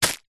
foley packet plastic tightly wrapped small rubber items catch hand